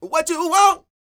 SING, Vocal Planet R&B & Dance; ILIO

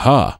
HAR; AMG

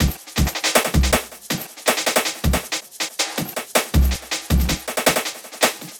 Beat, Futurist Drum & Bass; Drums & Percussion

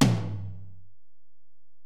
Tom, Alesis DM-PRO

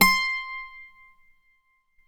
PLK C5 Bouzouki, Greek; NorthStar - Global Instruments VOL-1

